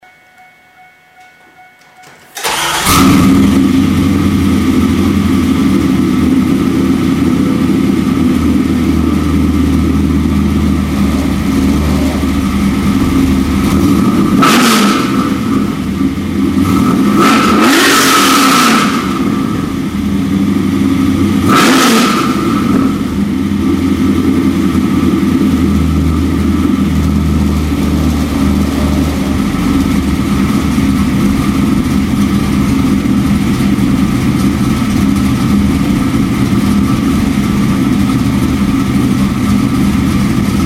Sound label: Flowmaster single, mufflex sound clips, LT1 based engine; spintech; singledown